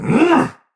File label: Pain Heavy; Tau; Dax; Battle Barks